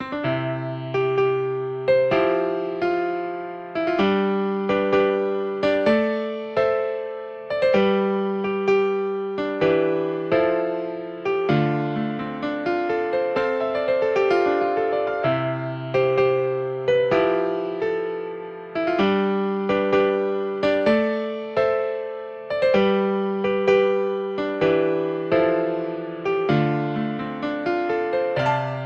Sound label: happy warm piano solo